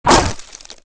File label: sand hit; pcrazy; Bovine; Dash